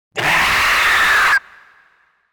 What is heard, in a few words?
Alien Screech Scream - Monster Dinosaur; Hollywood Edge; sound designer's toolkit v2